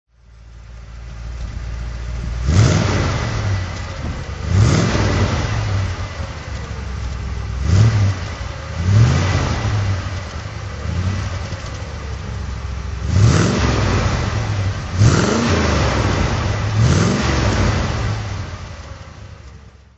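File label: facel throttle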